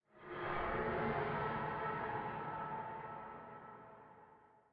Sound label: Gloombat Scream